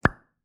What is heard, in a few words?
Ui Pop Up